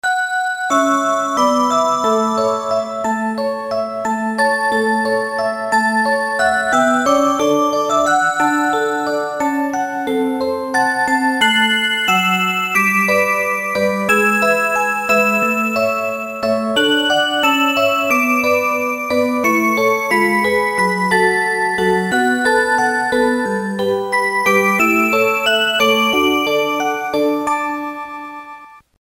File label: rhythm song, clocks